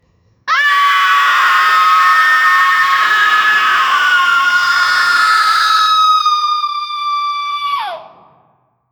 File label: eeeewaaaaoooo haunting, whale